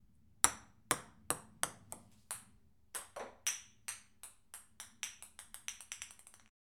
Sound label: Ping Pong Ball Bouncing